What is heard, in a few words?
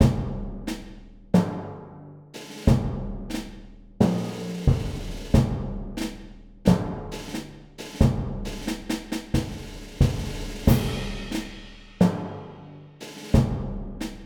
Drums of War; Bug Fables